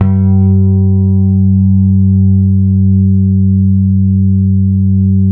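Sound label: finger G#3, 8-string bass; Spectrasonics; Bass Legends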